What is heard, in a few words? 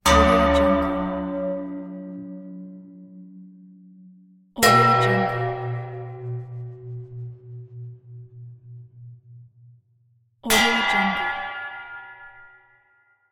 ringing bell